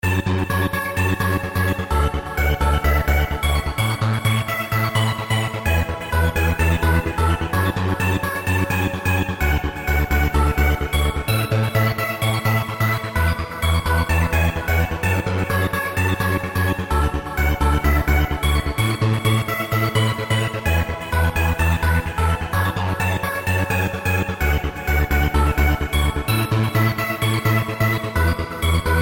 Binary Bells

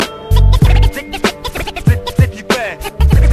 zip sms; hip hop

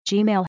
notification; gmail

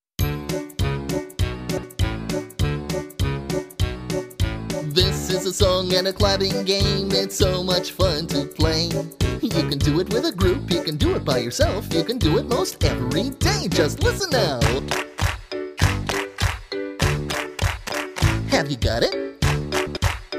clapping song; children

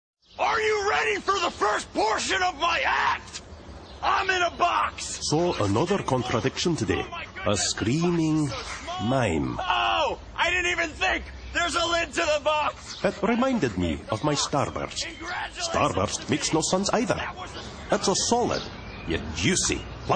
screamimg mime

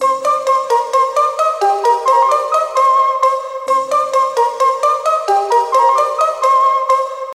Native tune

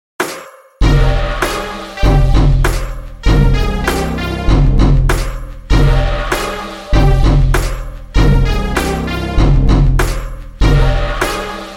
Dark Beat

